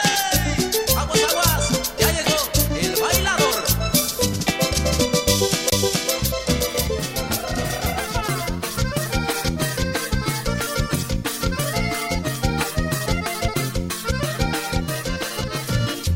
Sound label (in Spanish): cumbia mix; latino